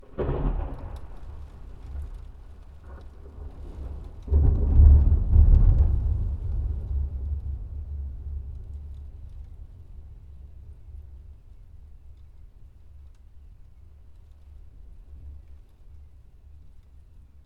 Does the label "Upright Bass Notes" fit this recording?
No